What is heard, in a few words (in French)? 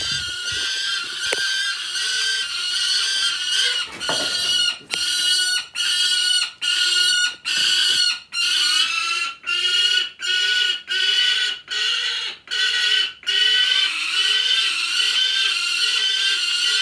Pelerins cris jeunes